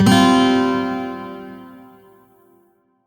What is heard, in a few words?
Shawzin Pent Min Chord G; Warframe; Lotus Shawzin